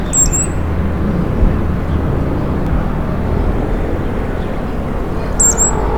Molothrus bonariensis

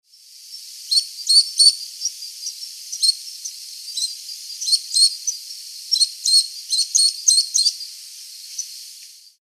Accipiter superciliosus calls; Santa Rosa

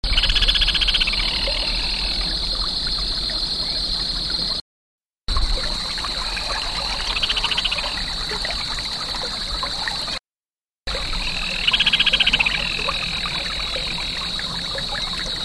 Laterallus xenopterus

